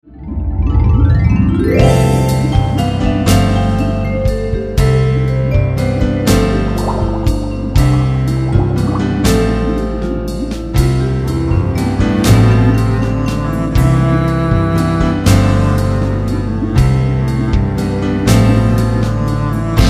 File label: Corsair Happy